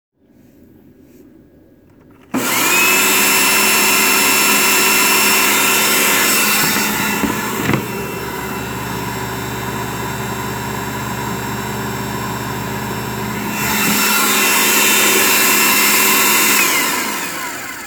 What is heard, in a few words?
karcher noise; countermeasure diy